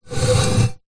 household plant pot ceramic drag on wood laminate floor